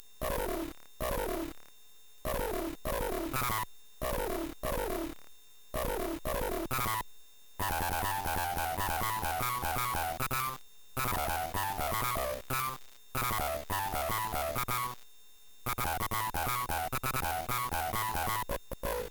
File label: clavinet voice; apple2